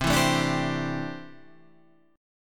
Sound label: C 6th Add 9th, C, down, Guitar, Half Step Down